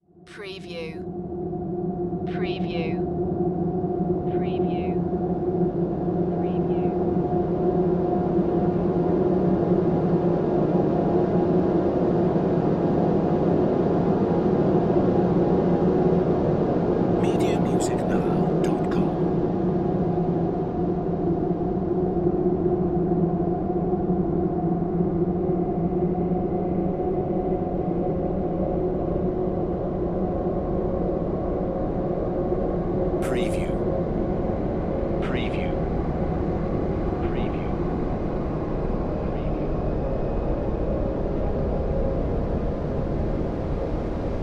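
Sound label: Production Element Bed Ethereal; Sound Effects Bible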